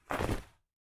Jacket Cloth Rustle

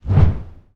Low Whoosh Bamboo

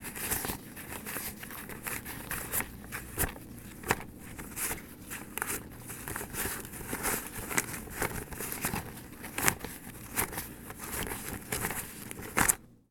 Counting Dollar Bills

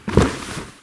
Body Falling On The Ground

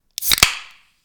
Can Opening

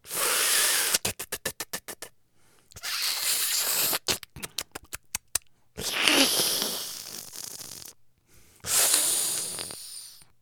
Vampire Sucking Blood

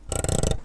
Metronome Weight Move

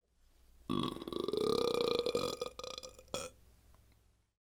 Human Sounds Burp

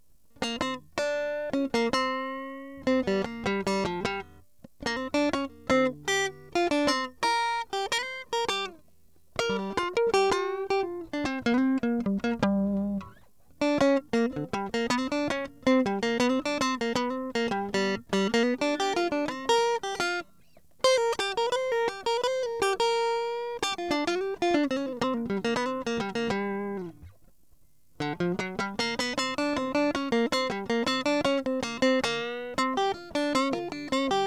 Guitar Riffs 105bpm G

